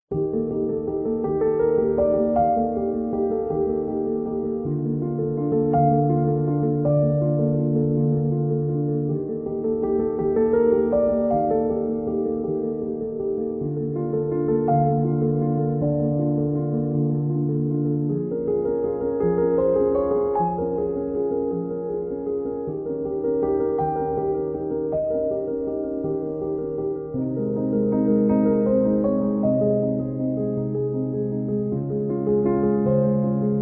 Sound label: Ambiance Dimanche Piano